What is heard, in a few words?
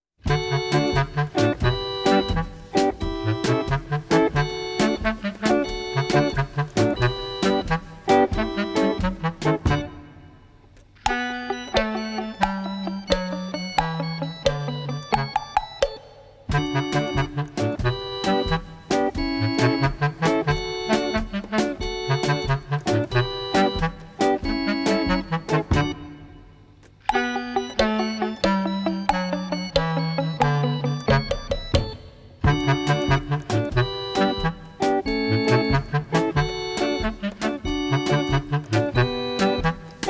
mini clarinet